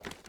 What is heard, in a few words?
reload fast start, weapons; xmag; gmod; viper